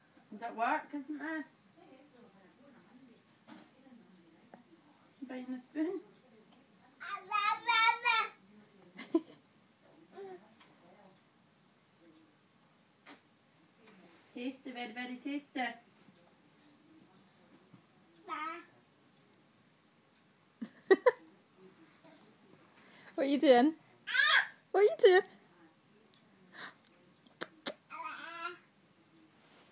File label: baby noises